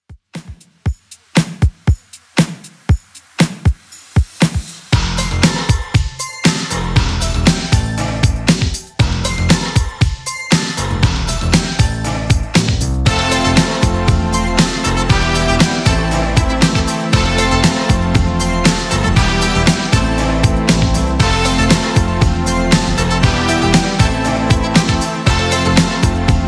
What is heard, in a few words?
80s Redrum 118 bpm